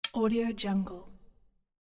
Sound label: click metal thing